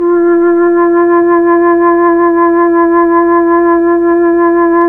FLT, Melotron Flt, Tron Flutes

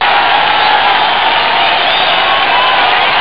spectator; ballistix; Amiga Soundtracker Sample Packs

